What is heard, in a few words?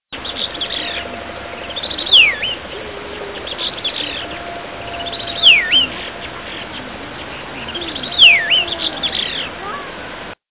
garrulax lineatus; birds; thrushs